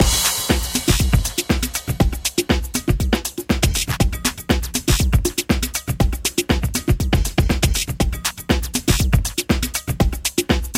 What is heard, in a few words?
Beat